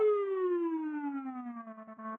fail sound; F u w a

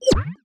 match start; Luxariah Default Minimal

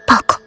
section fail; AriizuCA's Skin V3.17.6 Tail Yellow

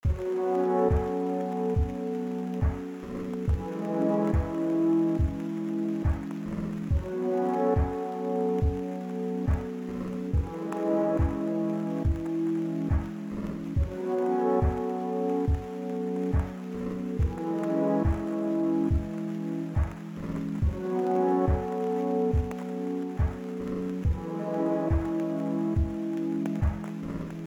pause loop; Aireu (megahello x Min2.0); Yellow

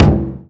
taiko drum hitnormal; Crimson Stella

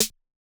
drum slider tick; AriizuCA's Skin V3.17.6 Tail Yellow

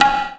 drum hit whistle; osu skins; nautzz night

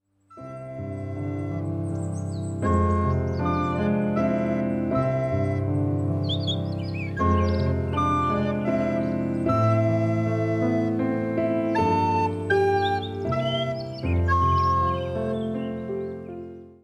pause loop; osu skins; vv idke trail

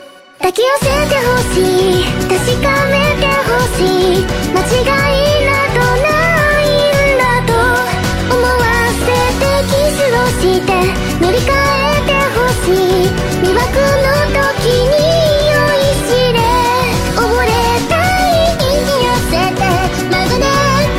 applause; Mrekk 3.0; Abyssal

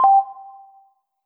menu exit click; 『DTMoon』

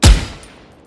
key press; Clicking Head

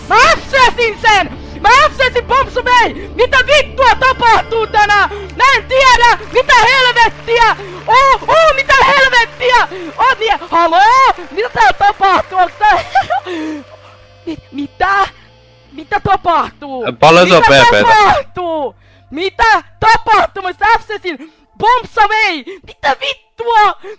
applause; Amasetic4.0; gnahus

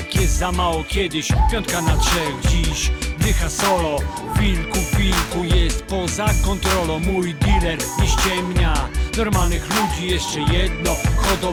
applause; osu skins; Dereban

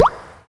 click short confirm; AriizuCA's Skin V3.17.6 Tail Yellow

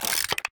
menu options click; 《CK》 WhiteCat 3.0 ~ DT

